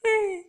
cute, creature

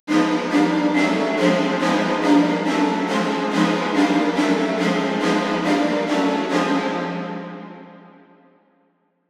Intense Strings Mid, horror strings

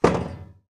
metal

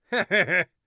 laugh, Warrior Voice; Warrior Voice Pack

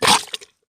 mud

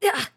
damaged, RPG Voice; RPG Voice Starter Pack

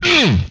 hit, vocal; retro arcade; MMRetroArcadeSoundsPack

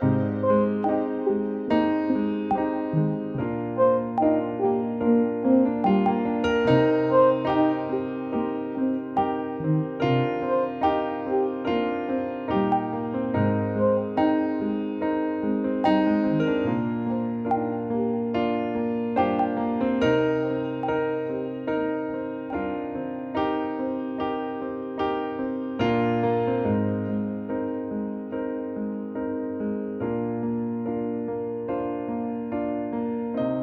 Ballad Piano; BB 2HTC Samples Vol 4; Solos

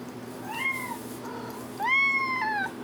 Cat Meows - Food Time; Micro Pack

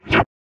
teleport, sci-fi; sci-fi sfx

